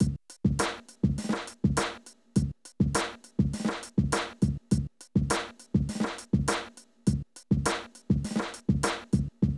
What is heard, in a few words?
Drum loops, Hip-hop